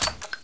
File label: Reload spindown, sniper; HL2